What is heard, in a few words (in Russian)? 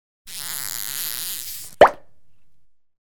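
multjashnyj zvuk shampanskogo, cartoon champagne sound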